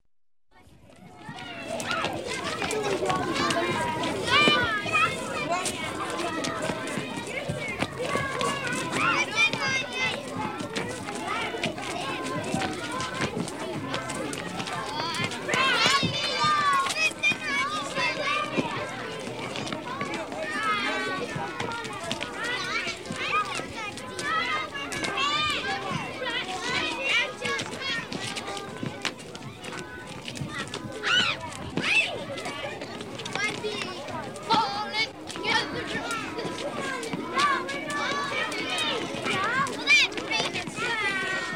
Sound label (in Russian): shkolnyj dvor